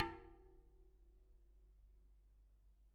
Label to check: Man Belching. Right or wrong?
Wrong